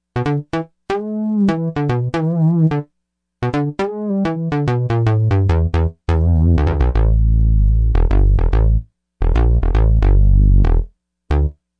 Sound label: VCO Bass, Yamaha TG55; Factory Presets